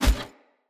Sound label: Foley Armour Step; Characters; Enemy; Mockeries; SubBoss; Mockery